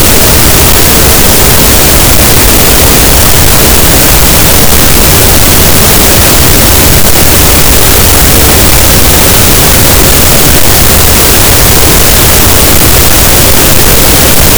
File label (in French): cachalot, Physeter Macrocephalus, gaussian noise